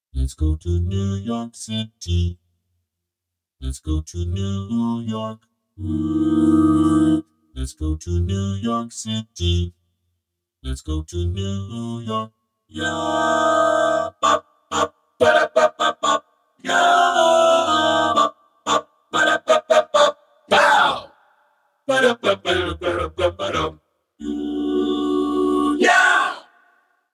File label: jazz scat